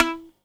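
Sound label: BAGLAM PIZZ E 5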